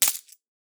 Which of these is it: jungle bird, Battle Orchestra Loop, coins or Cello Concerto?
coins